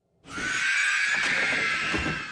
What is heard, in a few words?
Screech; Nazgul